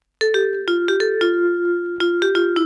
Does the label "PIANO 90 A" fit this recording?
Yes